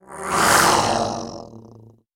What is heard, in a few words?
Alien Snarl Small; sound effects bible